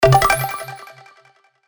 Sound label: Unlock; BattleBuddies